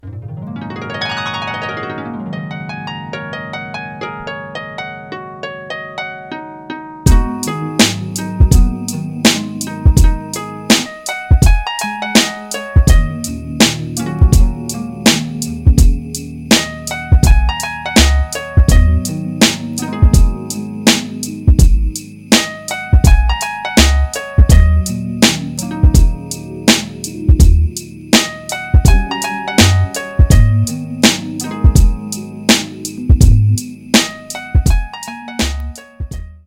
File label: instrumental